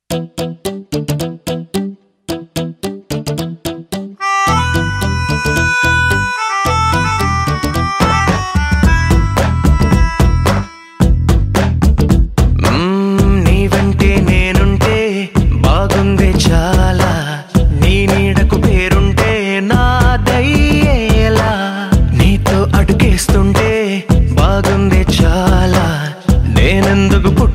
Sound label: Instrumental Telugu